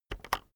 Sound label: keyboard external enter press slow